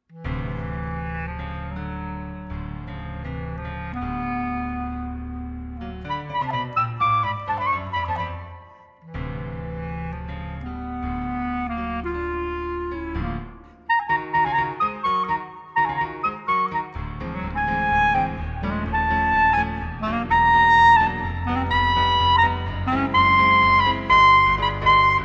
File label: clarinet piano